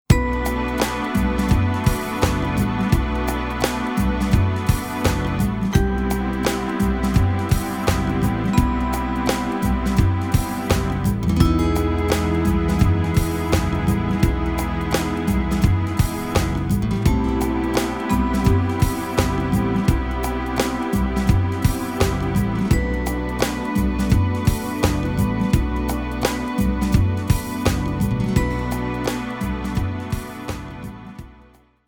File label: Relaxed